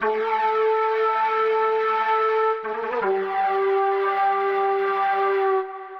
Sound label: flute, ethno, 160, C